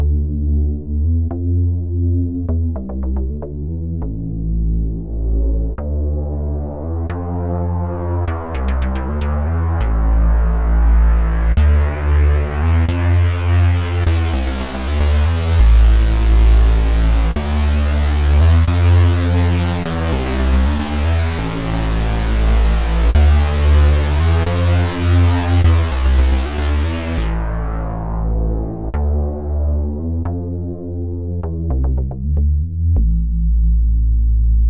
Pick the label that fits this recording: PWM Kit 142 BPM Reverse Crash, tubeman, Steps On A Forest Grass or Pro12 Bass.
Pro12 Bass